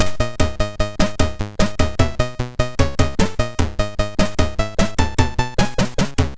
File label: MOS6581R3, sid; PET McKracken; Dr Terrorz